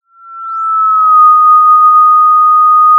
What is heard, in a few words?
Sound of a police car siren